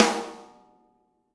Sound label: DRUM; DRUM SUGAR